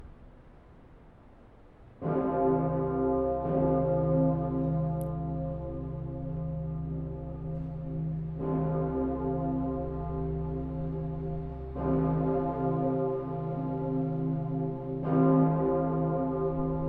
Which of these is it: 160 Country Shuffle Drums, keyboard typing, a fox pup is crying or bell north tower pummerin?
bell north tower pummerin